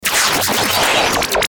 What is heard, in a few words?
Stinger Warble Zap; Production Element Imaging Element Accent Transition